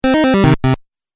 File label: 8 Bit Style Game Over; Video Game Sound Effects